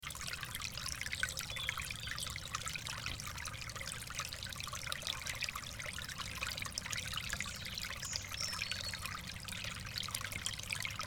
3d ruchei

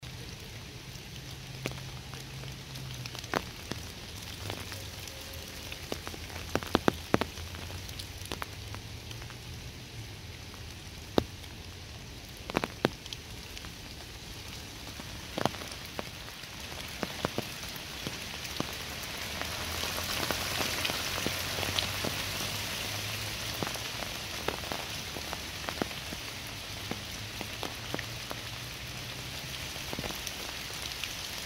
osenniy dojd

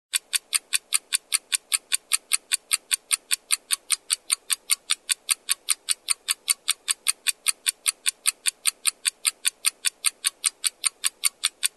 zvuk sekundomera

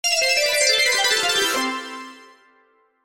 vse ochki proigranyi

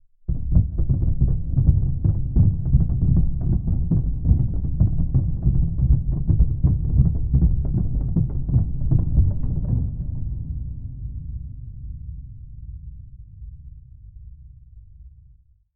zvuk topota sosedey

